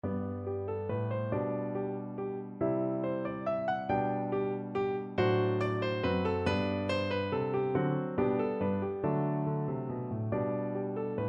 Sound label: piano, piano duet